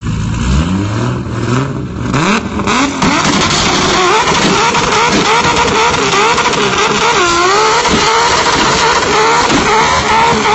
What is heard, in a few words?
Toyota Supra